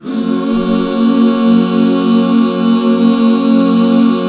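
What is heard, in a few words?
Gb major, back chords; Sound & Vision - Gigapack II CD 1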